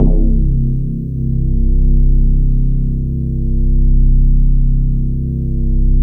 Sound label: E HARP R, DX HARP